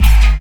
808 Bass, Fx; Sonic Foundry (Sony Creative Software); Esoterik Beatz

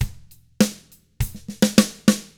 ROCK, Drums

